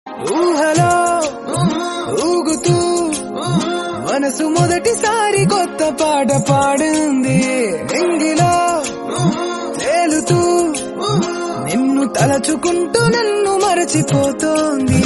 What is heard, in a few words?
telugu movie song; bro movie